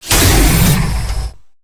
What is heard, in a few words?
tank melee; Quake 4 Alpha; strogg tank